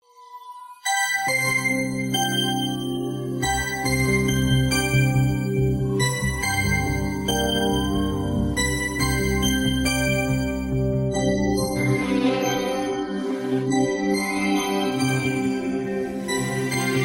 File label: Malayalam Song Music Vocal Remov